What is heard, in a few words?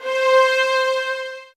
C, soft violins; Q Up Arts; Denny Jaeger Master Violin Library